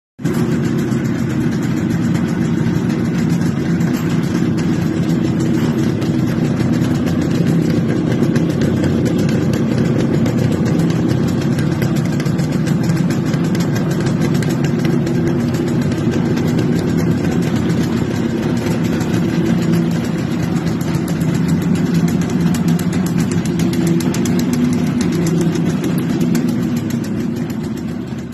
bgm ringtone

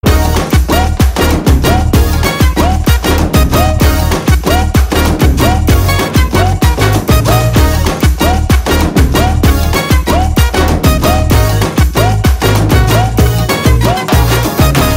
love bgm

